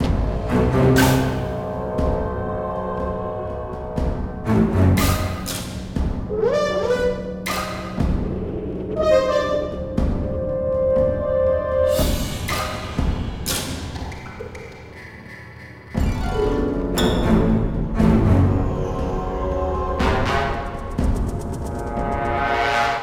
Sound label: Combat